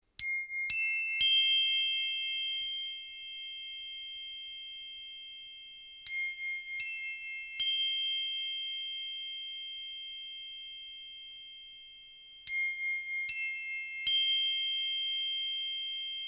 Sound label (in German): klangstab einfach